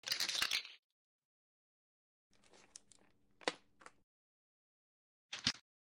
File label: monster Noise; Lethal Company